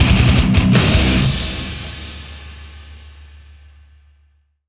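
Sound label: Multiplayer Unlock Kill Chain; modern combat 3 fallen nation; android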